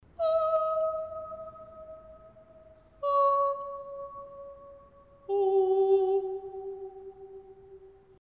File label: cathedral echo; Falaise; Holiday 2001 in France